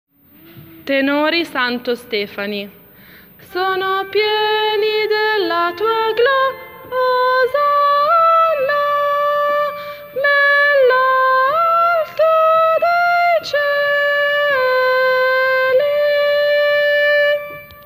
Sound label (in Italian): Santo tenori